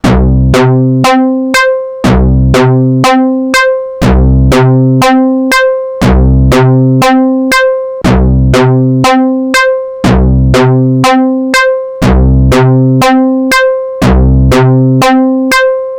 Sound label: sync filter, arp odyssey; korg collection arp odyssey test